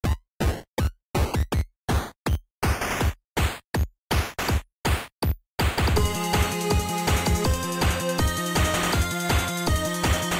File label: battle